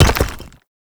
Mech Step Surface Rock; Vehicles; Step Surfaces; Warframe; Nechro Mech

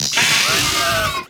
Amalgam Moa Vocal Death

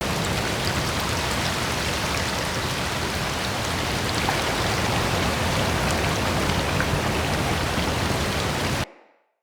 Waterfall Large

